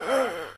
male death gasp

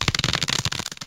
bone setter, Surgery; Medical

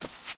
carpet barefoot, Footsteps

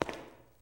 tile, Footsteps

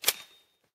breda30 close; Weapons; Guns Interact

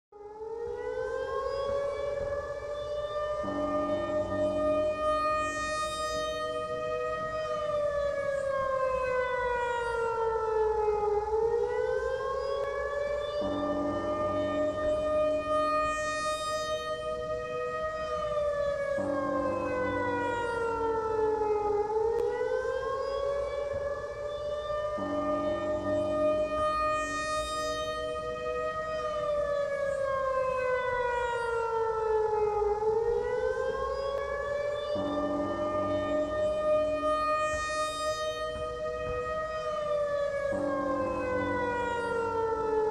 siren

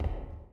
plating, Footsteps

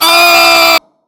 male scream

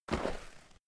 snow, footsteps; civ13; civ14; Civ Effects

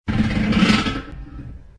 metal scrape

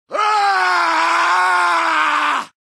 male generic warcry

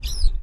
wooden closet open

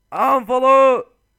charge

